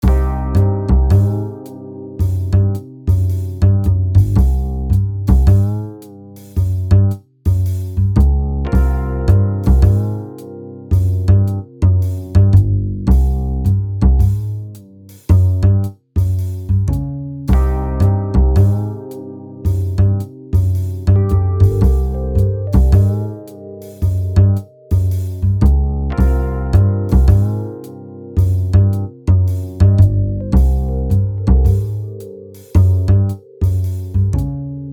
Jazz; garage bandilla tehty

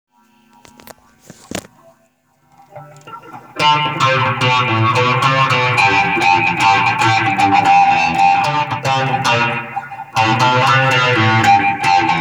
bassman ten progeriffi

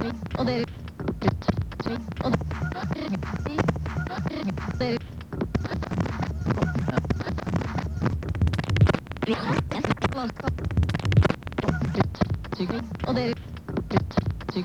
beat

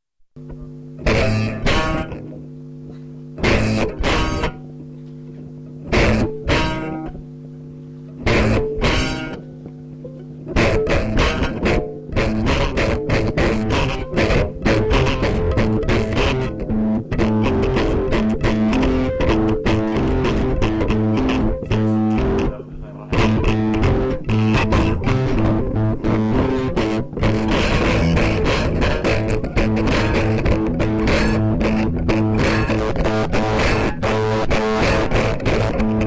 Intro (with Guitar), Live

